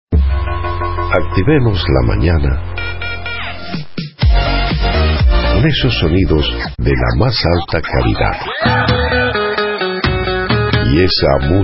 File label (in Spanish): Ambient